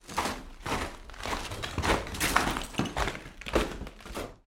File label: footsteps broken plaster rubble interior